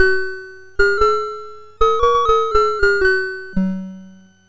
sid MOS6581R4, GAMES; Chinese Juggler

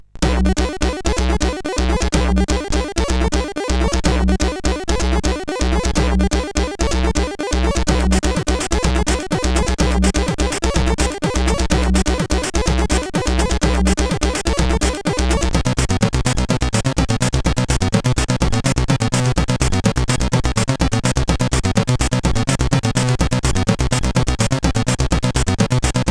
Major Techno Mix, sid CSG8580R5

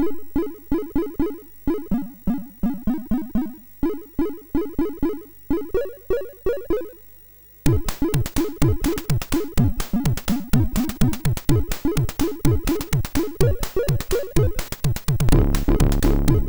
Tune, sid, MOS6581R3

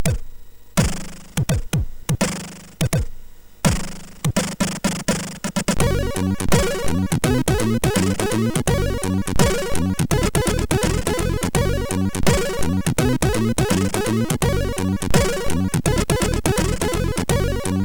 sid, MOS6581R4; Fuzzy

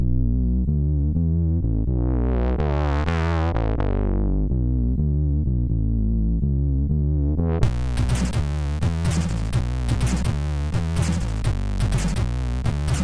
Meow, sid MOS6581R4

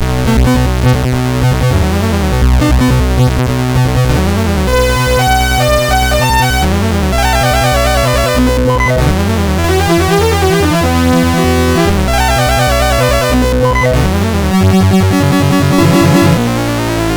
sid, MOS6581R4; Toonypoo 3 BASIC

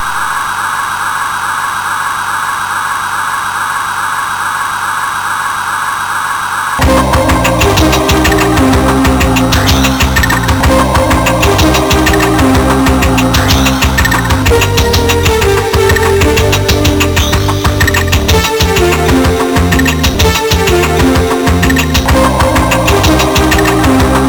digi, MOS6581R2

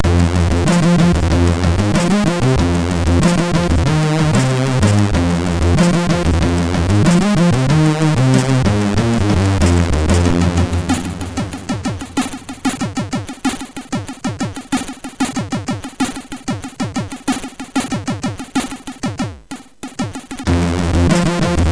Jingle Bass; sid

